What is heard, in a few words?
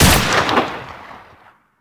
shot, weapons; f2000; gmod; fokku tc f2000